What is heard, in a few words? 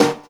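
snare drum hit, roots reggae; Sounds Good - Roots Reggae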